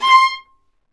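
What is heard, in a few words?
Marcato, Strings; Vlas Marcato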